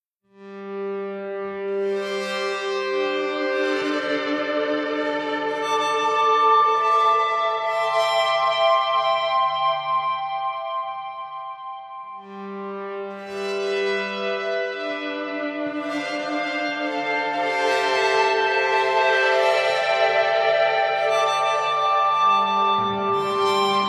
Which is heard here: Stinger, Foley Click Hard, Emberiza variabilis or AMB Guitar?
AMB Guitar